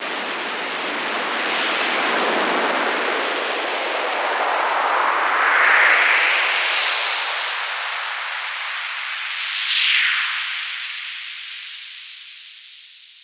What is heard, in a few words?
Noise FX; Singomakers; Infected Psytrance